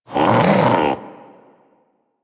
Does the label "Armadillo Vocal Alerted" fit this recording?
Yes